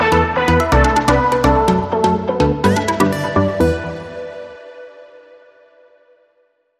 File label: Trumpets, Sting